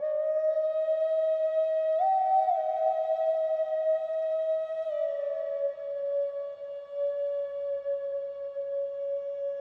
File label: Ocarina Layer